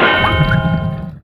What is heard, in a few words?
Pickup Underwater O2 Tank; Pickups